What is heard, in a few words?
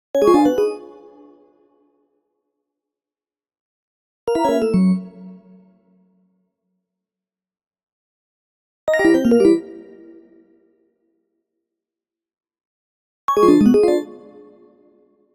Arcade Jingle Negative; boom library; The Interface